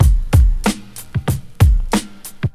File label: 124 bpm 00s hip hop breakbeat c key